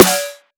Cosmic, snare

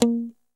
GTR FNKGTR, Clean E.Gtr, Strats; Roland LCDP02 Guitar and Bass; PYB Strats

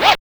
WHIP SCR LNG, SCRATCHES; Drums In Your FACE!

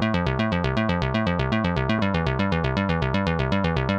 SH101 Arp (120BPM), retro house

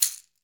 CAXIXI, Latin Shakers

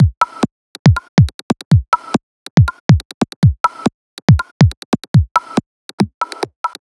140 drum loops; ChickenBone; M8; Electronisounds

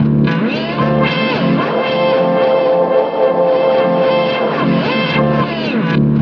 heal tones, guitars; Big Fish Audio - Alien Guitars; LOST HEALING

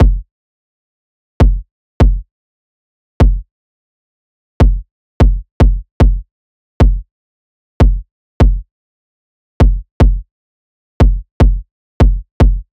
loop kick 150; Seismic Loops

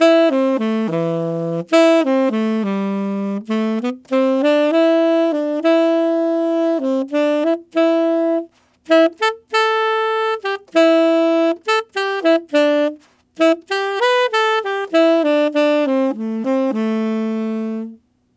Tenor Latin in A min, Tenor Sax Loops; Sonic Foundry (Sony Creative Software); Jazz Solos and Sections

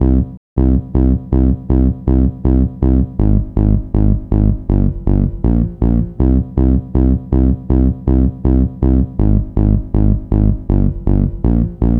gro 160, Hardcore Loops, Bass Loops; Dance Synths

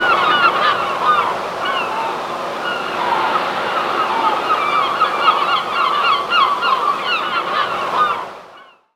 Birds; Zero-G Jungle Warfare 1, 2 & 3

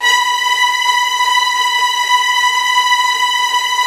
B3, Virtuoso Strings

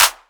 Clap; Equipped Music; Loungin House

